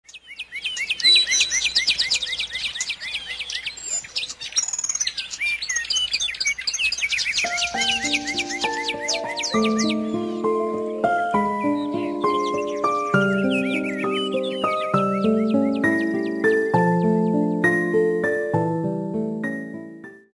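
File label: Alarm clock, sweet morning; miloe utro; Na budilqnik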